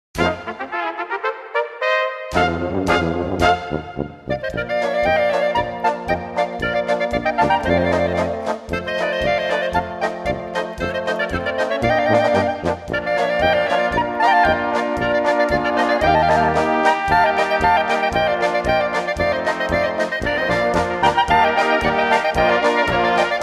Lachende Klarineten

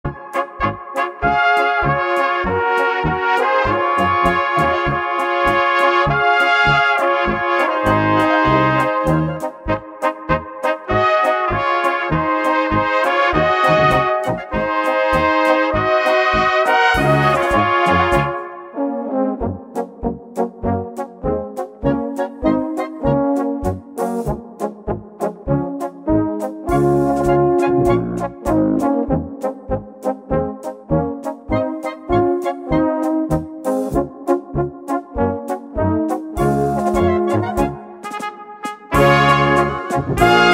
Musikantenpolka

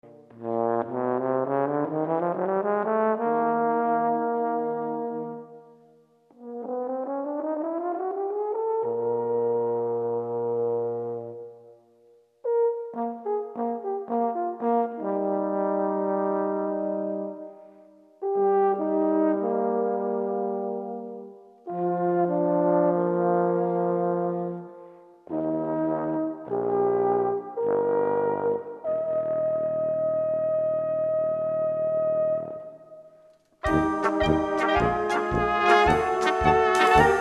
Tenorhorn kadenz

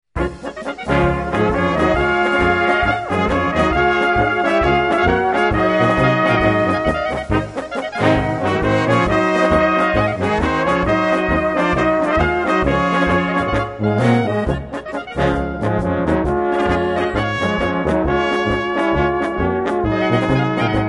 Polka

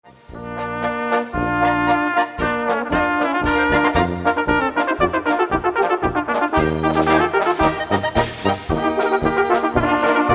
Polka

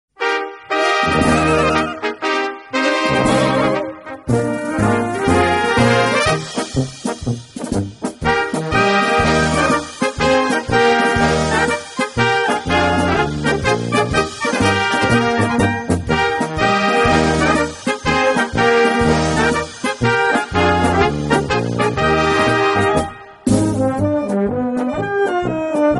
Marsch, Blasmusik

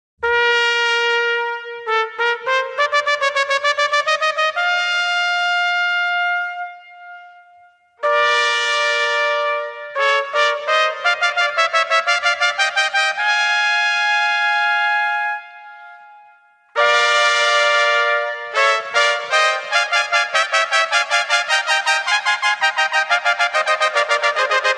Trompetenklaenge

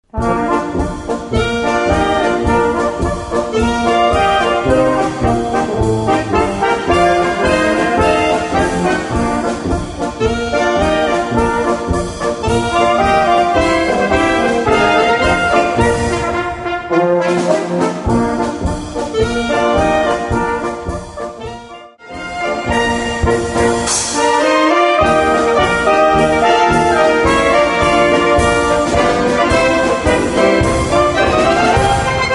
Polka

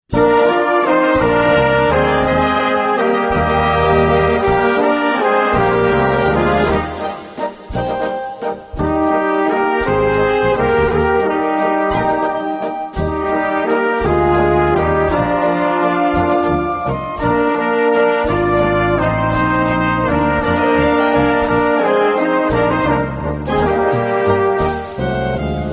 Blasmusik

